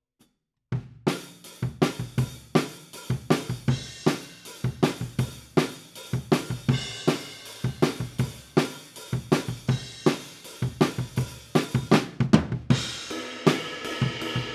Kit, 160 BPM

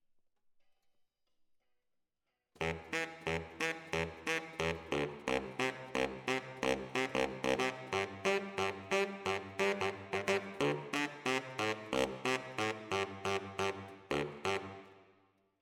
ORTF SCHOEPS, piano

